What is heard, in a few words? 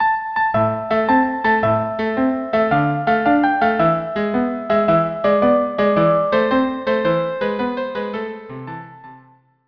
violin cello duo; Tarantella Napoletana; Composer: Luigi Ricci, arr. David Gable; Instrumentation: Violin and Cello